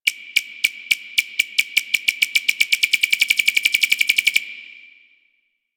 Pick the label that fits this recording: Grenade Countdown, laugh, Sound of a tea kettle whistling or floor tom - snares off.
Grenade Countdown